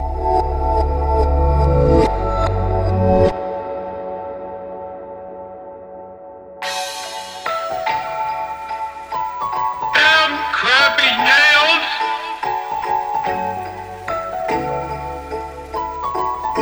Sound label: free to use non copyright drill beat